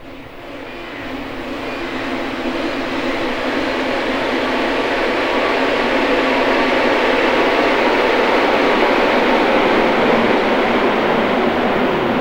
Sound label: STR, String FX; Roland - LA Composer vol. 2